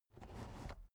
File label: car headrest full adjustment soft